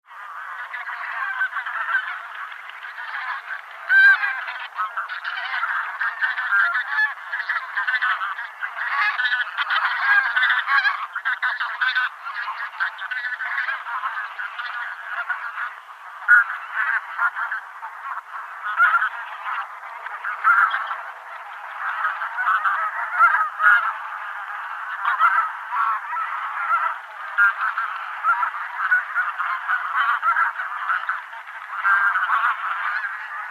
gumennik golos

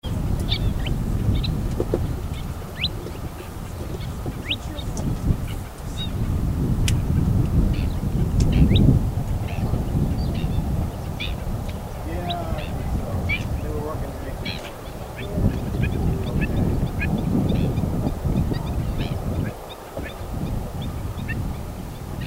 morskoy zuek golos

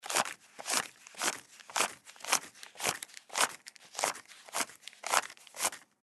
zvuki deneg

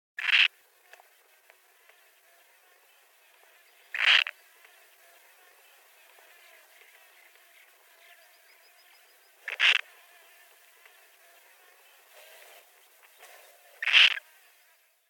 filin golos

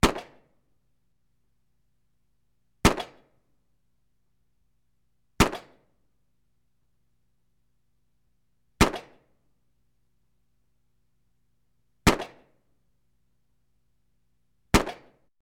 zvuki strelby

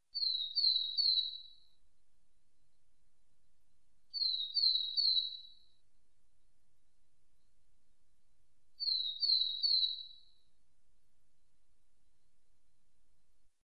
burogolovaya gaichka golos

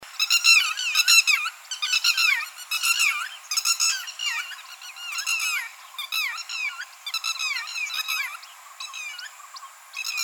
chibis ukrashennyy golos

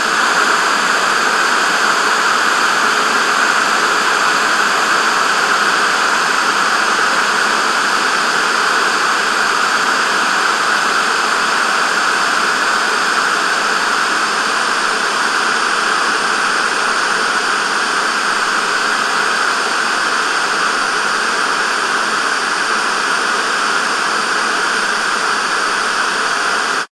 zvuk televizora

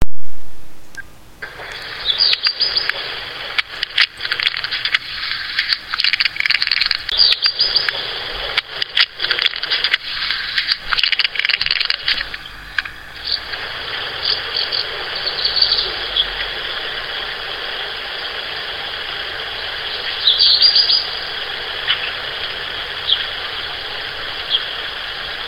gorihvostka chernushka golos

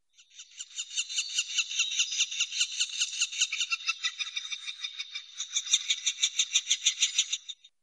sredniy dyatel golos

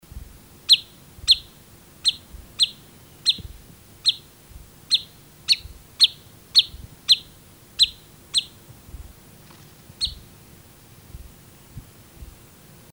fifi golos

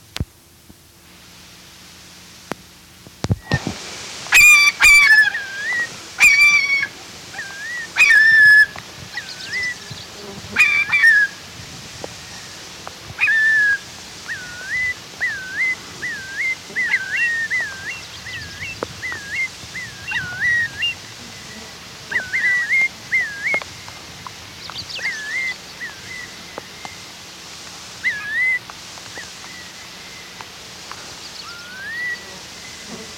dalnevostochnyy kronshnep golos

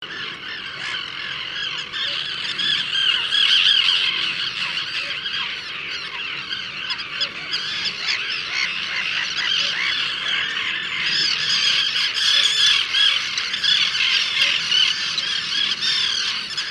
rechnaya krachka golos

